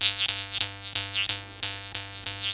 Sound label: KHOMUS